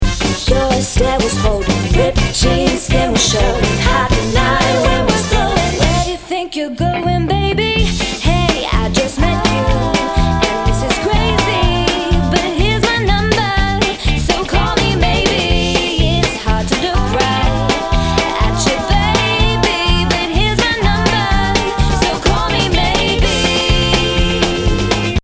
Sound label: Digital Swing